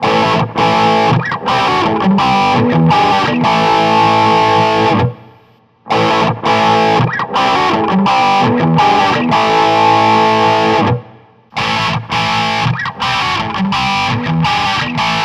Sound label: tone, axe-fx-ii